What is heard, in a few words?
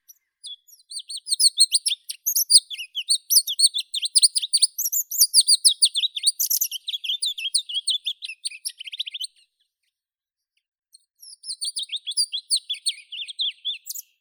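Pallas's leaf warbler Phylloscopus proregulus, nature sounds; penochka korolkovaya; zvuki prirodi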